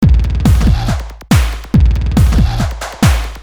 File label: Loop